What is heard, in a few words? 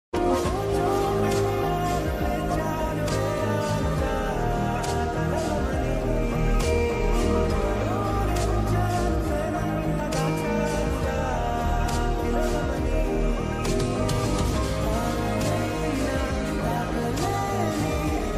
song ringtone south bgm ringtone; om bhim bush